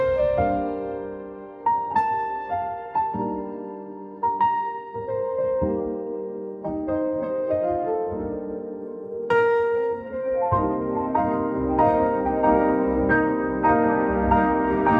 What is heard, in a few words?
piano